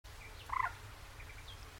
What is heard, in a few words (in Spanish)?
Gallinula chloropus Gallineta común